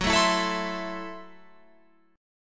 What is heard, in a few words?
Gb Minor 7th Flat 5th, Guitar Open F; strummed